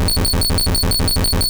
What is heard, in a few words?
Zap Cannon; sfx; attack moves; pokemon; gen 2; gsc